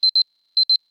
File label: Alarm Beep; Samsung Galaxy-Dart-VTR